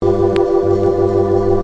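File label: soft major 7, Organs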